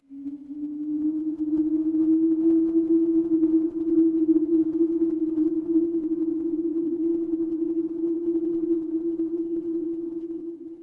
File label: ambience wind